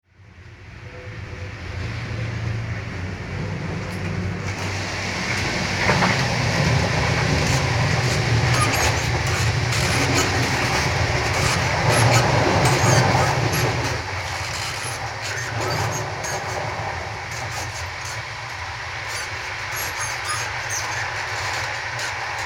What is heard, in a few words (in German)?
Zugfahrt